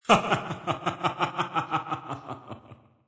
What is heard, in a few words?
Laugh Evil, Male laugh, w. echo FX, (human voice); Laughter Sound Effects